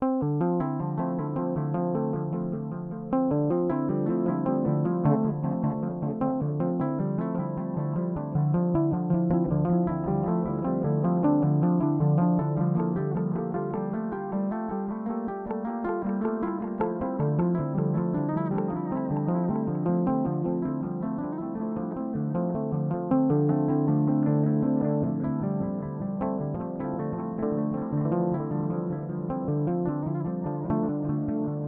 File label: Timeline Stereo Dual; Strymon